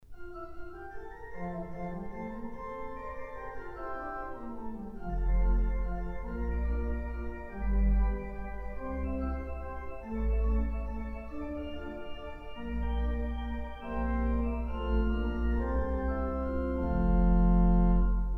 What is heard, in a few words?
Krebs Prelude and Fugue in F; Bwv 556